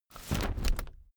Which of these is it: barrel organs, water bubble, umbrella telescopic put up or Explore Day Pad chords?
umbrella telescopic put up